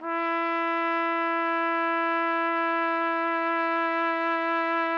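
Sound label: trumpet; Roland - LA Composer vol. 3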